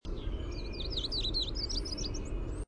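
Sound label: song, Black-and-white Warbler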